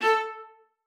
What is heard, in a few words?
MART A4, VIOLA SPICC; Xsample VOL-5; 90 s Sample CDs